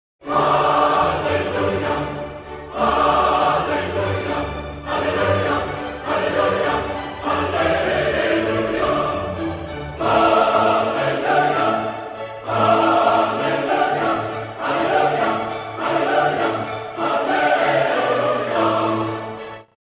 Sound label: Hallelujah Chorus ringtone; handels